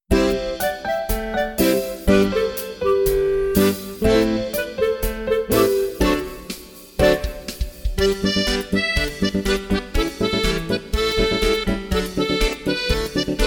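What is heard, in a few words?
Railway Foxtrot accordion piano clarinet or flute guitar drums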